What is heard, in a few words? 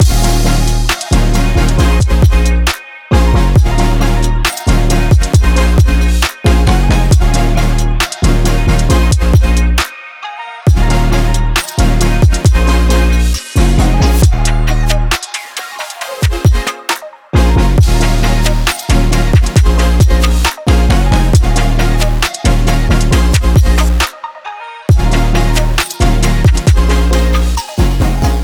stylish future bass